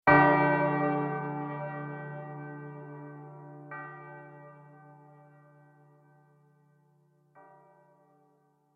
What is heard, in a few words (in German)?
glocke ton massage